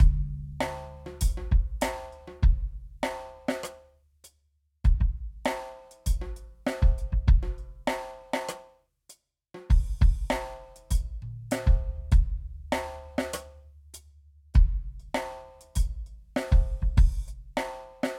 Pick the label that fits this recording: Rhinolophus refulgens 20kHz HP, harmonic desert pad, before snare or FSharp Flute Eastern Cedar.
before snare